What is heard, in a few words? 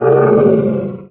MONSTER Groan Hurt; MONSTERS & CREATURES; mono; Universal Sound FX